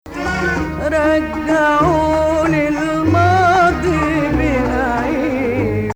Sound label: Rast Maqam Nakriz